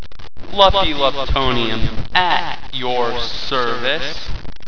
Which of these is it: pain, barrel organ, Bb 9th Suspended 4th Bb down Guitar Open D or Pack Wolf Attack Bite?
pain